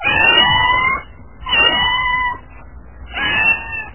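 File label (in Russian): мяуканье кота который попал в беду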